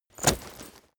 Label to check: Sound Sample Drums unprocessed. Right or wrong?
Wrong